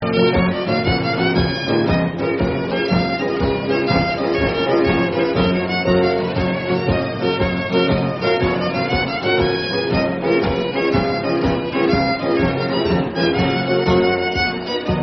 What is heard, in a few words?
6 8 F B Flat; Kranz; Nameless Tune